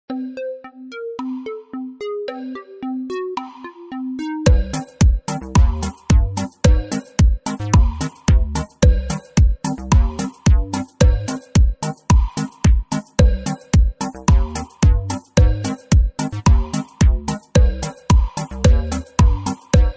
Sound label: In Game Music (20 Second Countdown); Kahoot